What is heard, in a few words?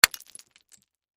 Crash Pottery Clay Pot Break Drop; Crashes; Sound Effects:Objects; Blastwave